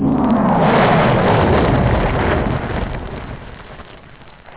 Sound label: whoosh; da capo vol1